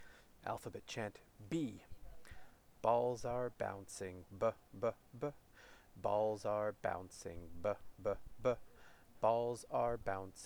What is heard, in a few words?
alphabet chant 'b' fast